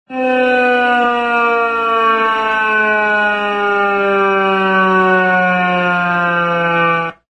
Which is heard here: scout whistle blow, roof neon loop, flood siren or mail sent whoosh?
flood siren